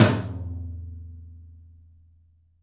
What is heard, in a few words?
OPEN TOM; 3D Sound; 3D Drums